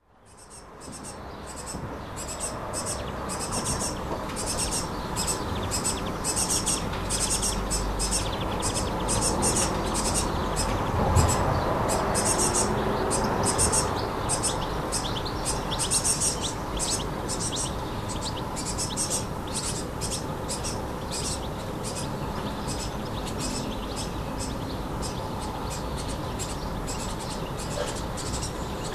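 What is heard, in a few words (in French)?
Famille de mésanges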